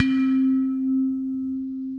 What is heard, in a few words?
Ceramic Melodies, Majestic Mallets; Multisampled; Ableton; Mood Reel